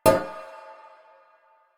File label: Stone Cafe Table Impact; Warframe; Nineteen Ninety Nine